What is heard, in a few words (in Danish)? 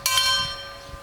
Klokke